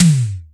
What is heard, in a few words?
202 tom, Drums, Boss DR-202